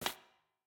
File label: Step Wood Noise; MiSide